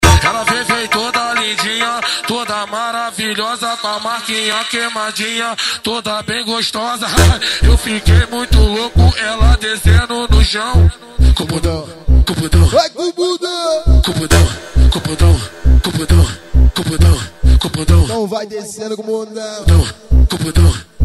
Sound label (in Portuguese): funk ritmado; especial cuiaba